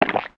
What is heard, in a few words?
cute swallow